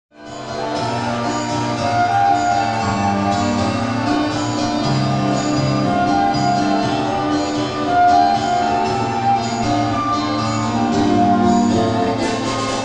ORQUESTRA

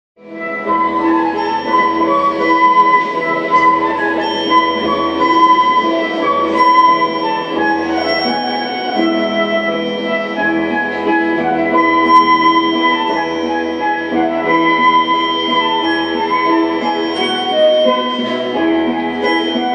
PRIMAVERA VIVALDI ORQUESTRA

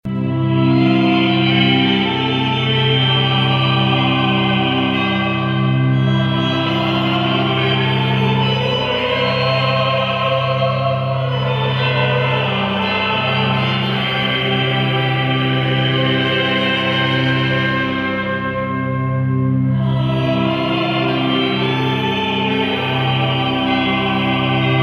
CORAL E ORQUESTRA; AGNUS DEI

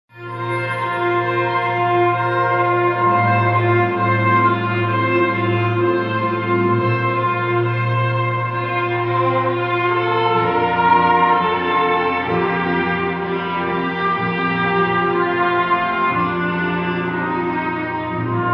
ORQUESTRA

